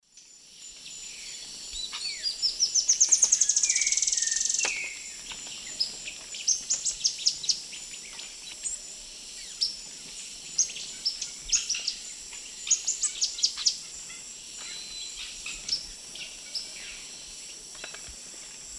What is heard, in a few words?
Terenura spodioptila, song and calls; S. America; Eastern Lowlands